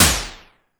SYNTOM MD; Back In Time Records; Big Bang

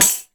PANDEI JINGL, BRAZIL

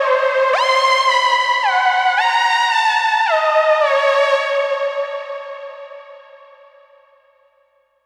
Mars Riff, Funk; Dr. Fink's Funk Factory

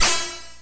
blips, opl3

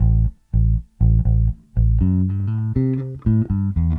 Bass, 70s Analog Funk

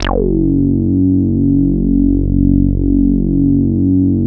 Moog Taurus, F#1; Big Fish Audio - First Call Vintage Keyboards

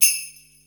sleighbell, Yamaha Motif ES drum machine; 300 Drum Machines; Yamaha Motif ES-Series

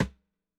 SNARE RIMSHOT

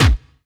BD SD; Best Service; Dance Mega Drums

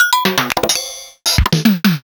Casio HT-700

